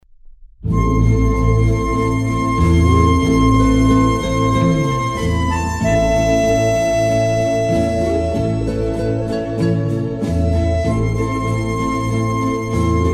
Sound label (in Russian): Мелодии трубы